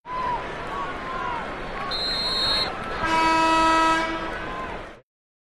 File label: Basketball Game Ref, sports arena